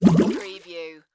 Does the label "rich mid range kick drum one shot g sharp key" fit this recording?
No